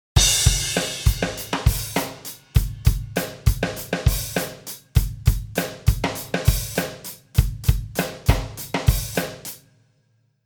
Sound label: Drum